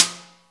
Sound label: BRUSHES